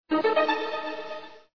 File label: reveal, ringtones; Metal Gear Solid